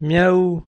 slowed instrumental

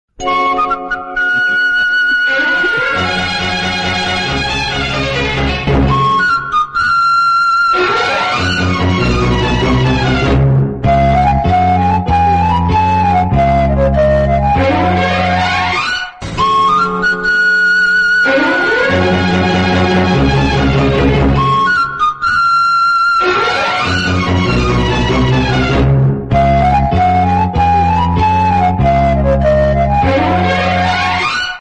malayalam background music; naranathu thampuran

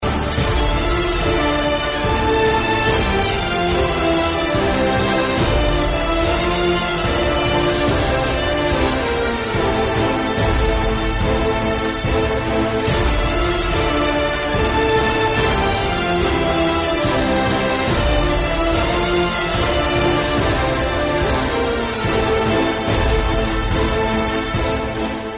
turkish drama sad ringtone

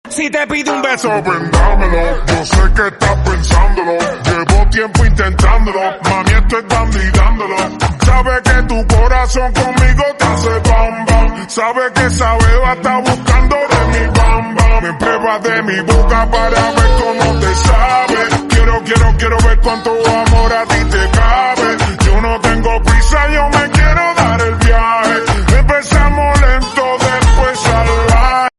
slow motion, english song

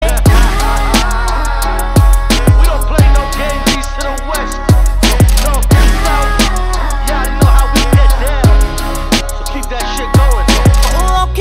Instrumental Ringtone

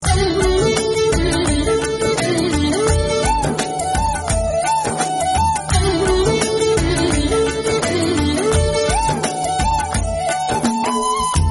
flute tamil melody

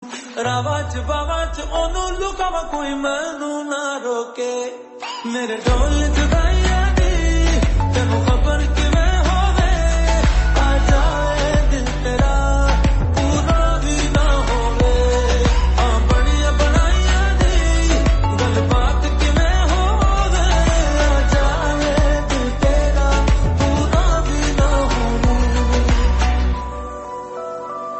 punjabi song

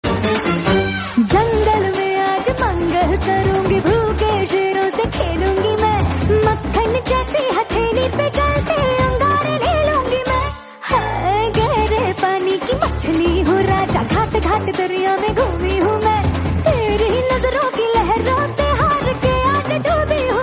song; agneepath

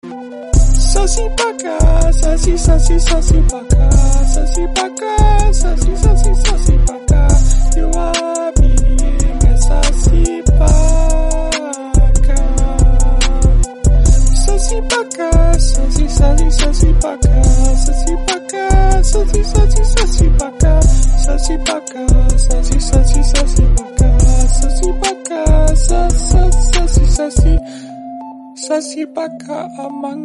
funny song

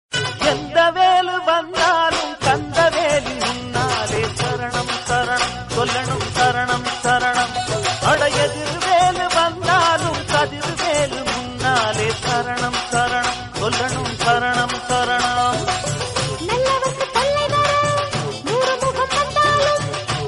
bakthi padal, murugan, god

